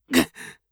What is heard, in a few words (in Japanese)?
ぐっ！, 戦闘ボイス（青年・中音）